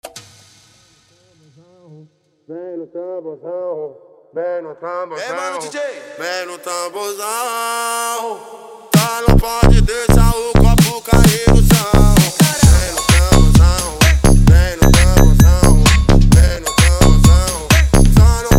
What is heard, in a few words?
funk in beat